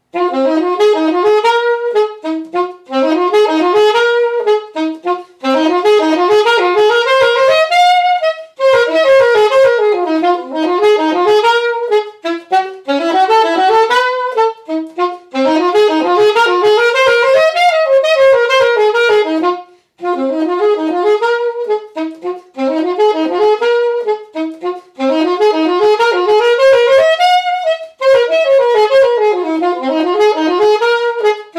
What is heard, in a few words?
alto sax